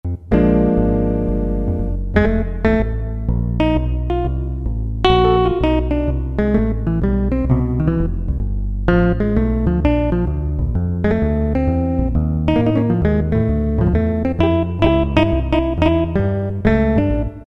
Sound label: Jazz Guitar, Roland PN-D50-02